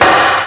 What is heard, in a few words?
drm, a#3, EMU SP 12, DRUMCOMPUTER